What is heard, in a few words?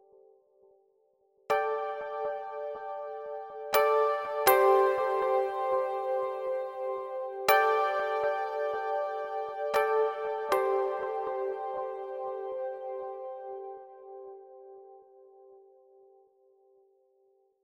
Shimmering Pad; Parawave Rapid